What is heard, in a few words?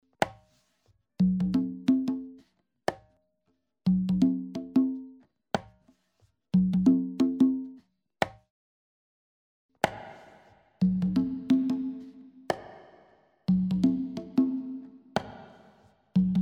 Plate V2 Bright Plate